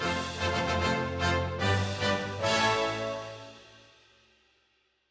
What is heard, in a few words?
victory, rpg game tracks; 25 Rpg Game Tracks